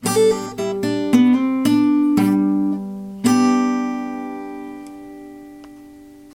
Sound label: e shape major embellishment lick